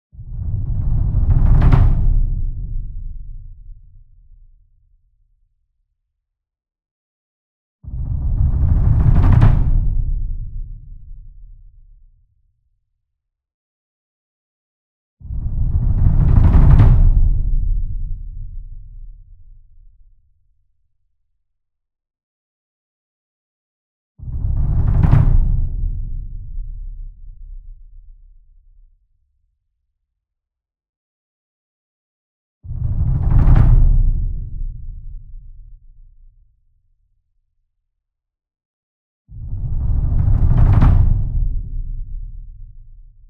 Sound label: DRUM 66 Inch Roll Soft Beater Near; SINGLE; Verdi; boom library; Cinematic Strikes Construction Kit